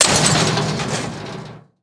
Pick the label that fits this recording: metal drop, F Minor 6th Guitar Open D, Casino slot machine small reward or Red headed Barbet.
metal drop